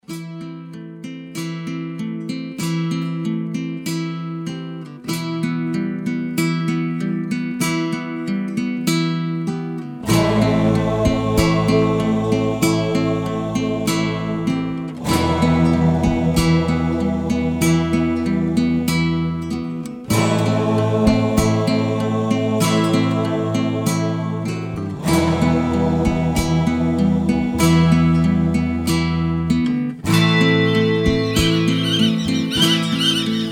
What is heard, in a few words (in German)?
glocken